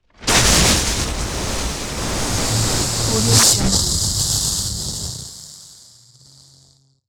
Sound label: energy thunderclap fx attack; soundmorph